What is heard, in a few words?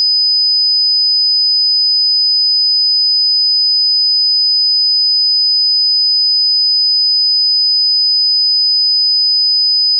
5000Hz 75pc right